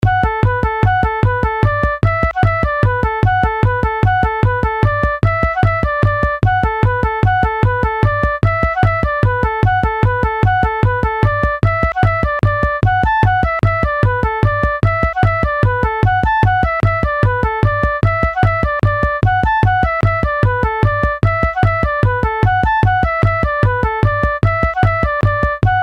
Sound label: Polka with moderate rhythm